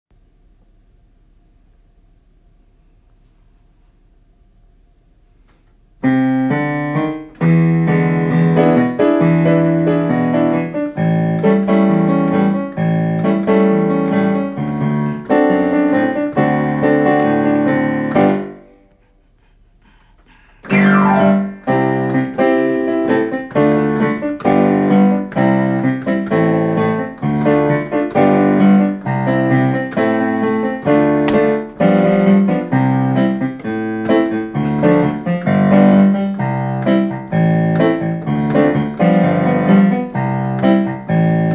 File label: Accomp